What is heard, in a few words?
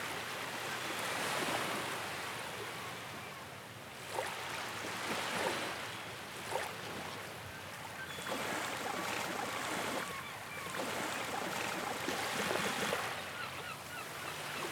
sea sound free spins; Hungry Shark; Wazdan